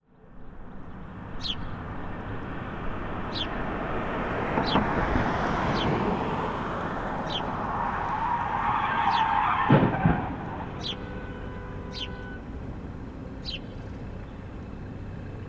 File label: Car Crash And Jerk Birds